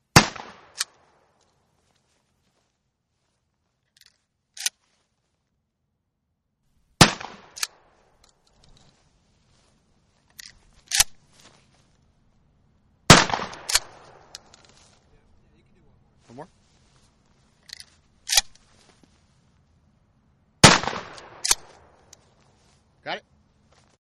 Remington 870 Shotgun Multiple Shots And Reloads; VRSonic

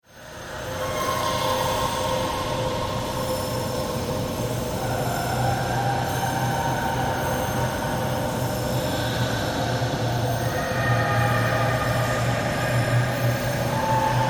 Horror Dungeon Screams Chains Water Drips; Scary Soundscapes

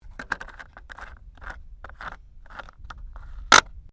Hyundai Accent 2008 petrol cap tighten; Hyundai hire car; Cars; Sound Effects:Vehicles; Foundation3

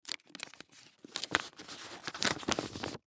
Envelope Open; Paper; Office; Blastwave

